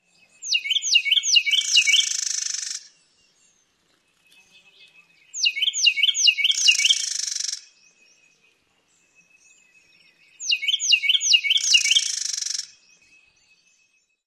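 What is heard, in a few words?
Carolina Wren male song and female chatter duet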